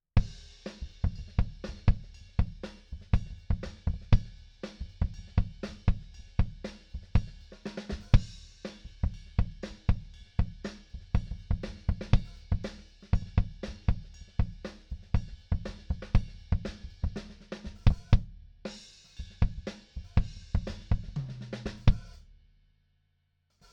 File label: Kick In; Reaper project